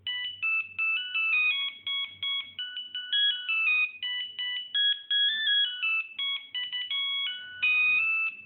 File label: nokia 1100 merry xmas ringtone